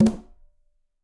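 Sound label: Tumba A Flam Mute, Conga, Latin Percussion; Drums; Drum Hits; Ableton; Factory Packs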